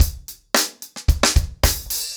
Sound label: 110BPM, acoustic drums; The Roots Drumloops